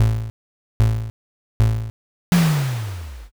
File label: cd deep